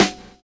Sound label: PICCOLO